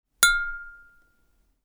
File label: UI Quirky